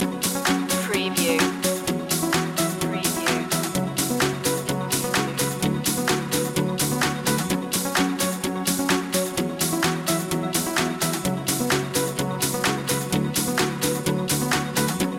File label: time piece loop